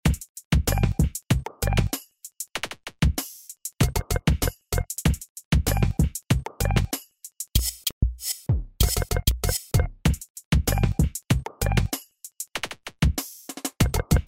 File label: Hiphop